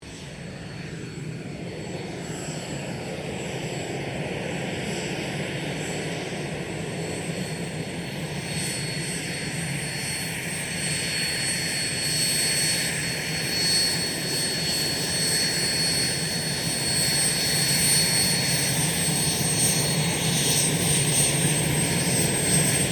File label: AIRCRAFT CESSNA A-37B DRAGONFLY TAXI SHUTDOWN, Jet Fighter /Bomber; Airplanes (Sound Effects:Vehicles)